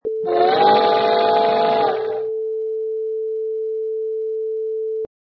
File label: Train Whistle